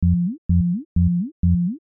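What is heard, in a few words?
140 explosion kick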